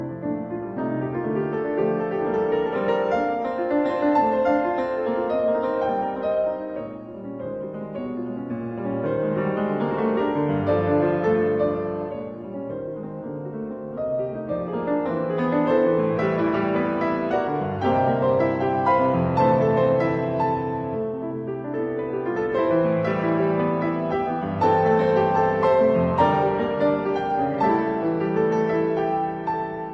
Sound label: Preludes in D minor Allegro; Op 103; No 5